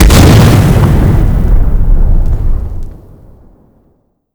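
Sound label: magic fireball explosion; skyrim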